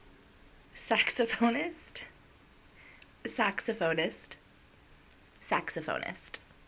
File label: saxophonist